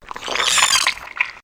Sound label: Snail Birth